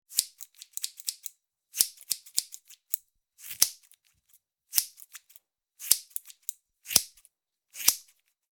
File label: Cutting hair scissors snapping